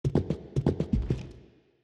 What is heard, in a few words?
walking Wild Movement; godsoffortune